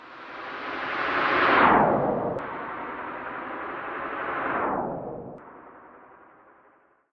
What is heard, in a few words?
broken flyby machine, Electro and Synthetic